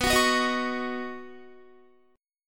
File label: B Major Add 9th, Guitar chord; Badd9; strummed; 4F,4A,5C,5G,5C,6E; 18,21,18,20,18,21